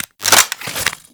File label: Minigun Reload; Warframe; Corpus